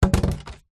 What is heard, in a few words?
foley container water plastic empty place down on ground